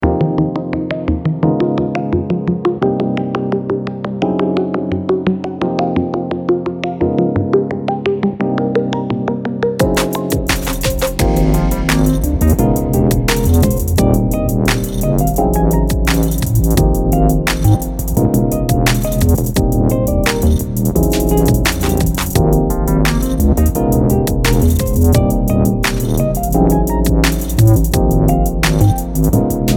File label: Jazz Dubstep